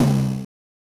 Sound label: Timpani; Genesis